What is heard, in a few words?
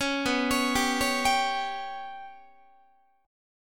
B Suspended 2nd Sharp 5th down Guitar; Bsus2#5 arpeggiated; JGuitar